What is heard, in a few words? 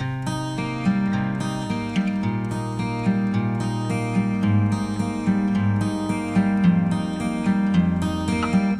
guitar, Loops, Sad